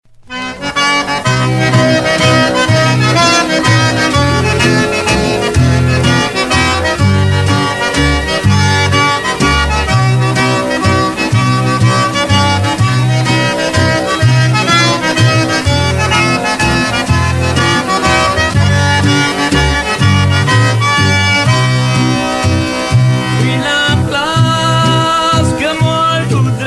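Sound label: Waltz, Cajun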